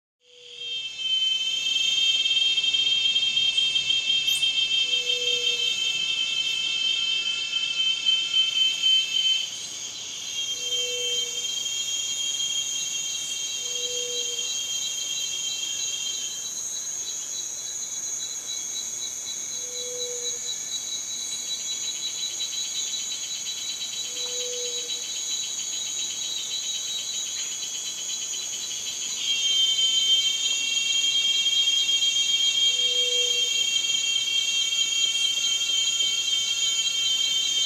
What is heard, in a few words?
Forest at night